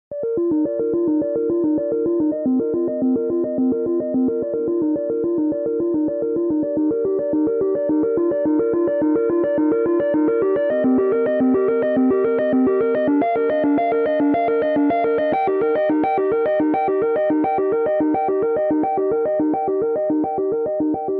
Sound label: apple departure ringtone ios